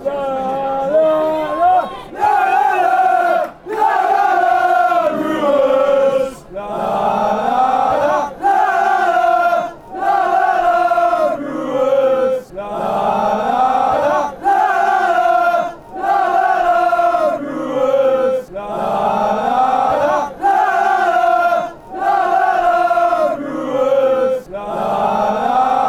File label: hey fan chants; brewers